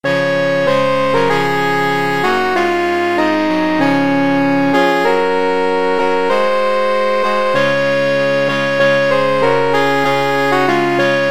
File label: joy to the world, saxophone quartet